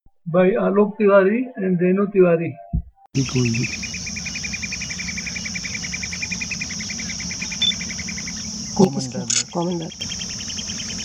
Common babbler call; alok

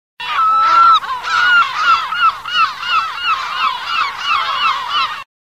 Larus fuscus song

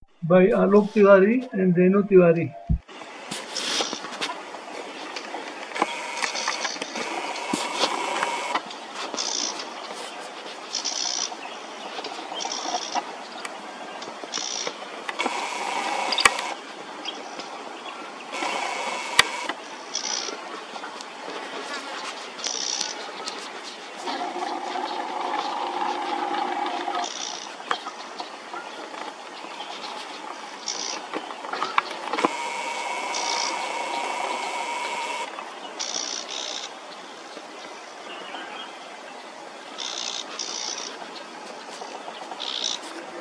Blyths Reed Warbler; Alok

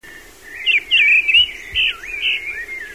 Turdus viscivorus song

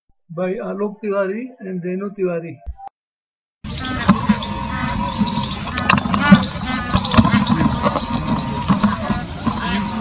Greylag goose call; alok

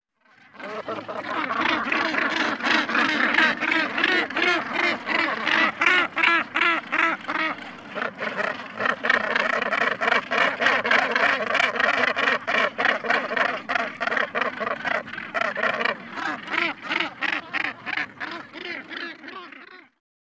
Sulidae Northern Gannet

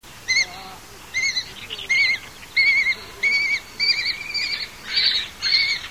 Falco naumanni (song)

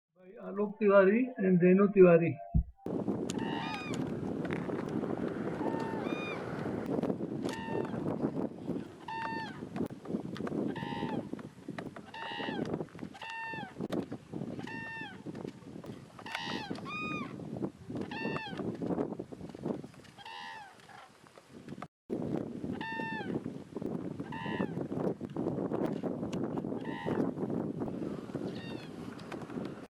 Common crane; alok

